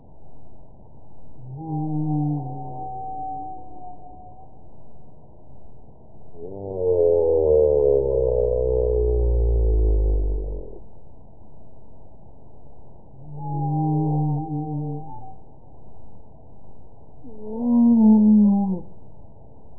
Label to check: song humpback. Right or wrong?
Right